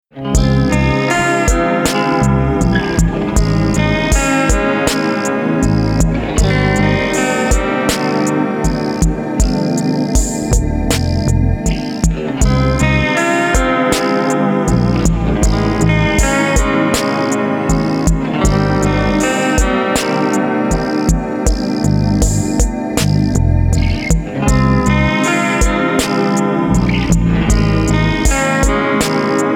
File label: Instrumental